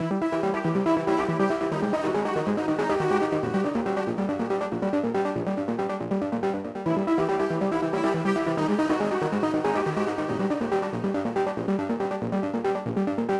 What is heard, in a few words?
Boss Battle Main Loop Layer; Glitch's Trip